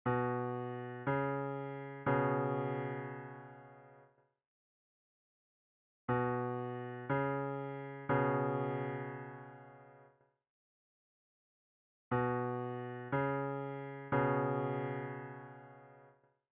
bass b c

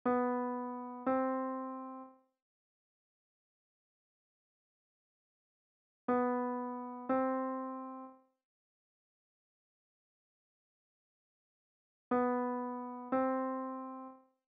bass bb up

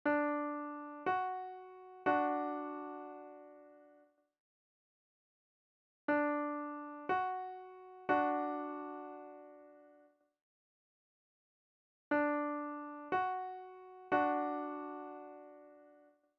treble d f

